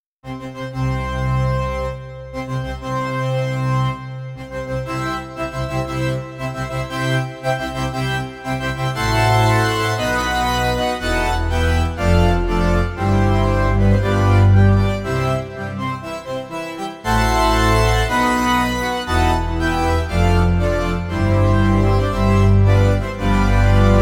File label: wedding march organ